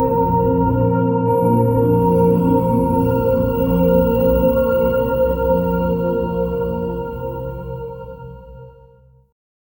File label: divine cinematic sound